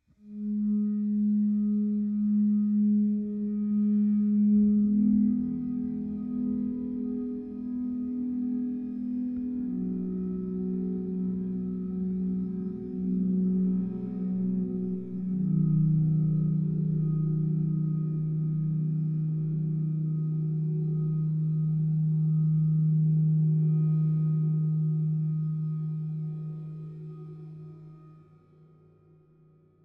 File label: Ambient Pad